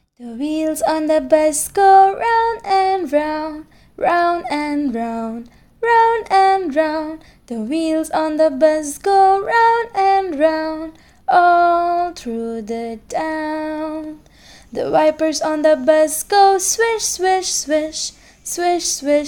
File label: kid's song